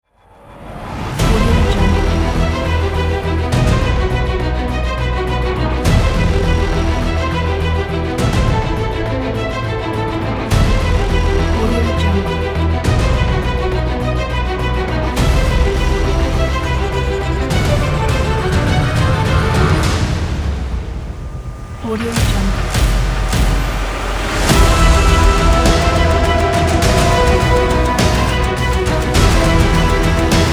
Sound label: epic trailer